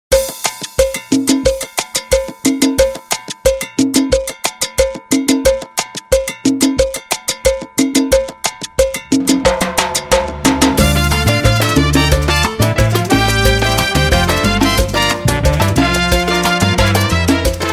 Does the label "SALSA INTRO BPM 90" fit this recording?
Yes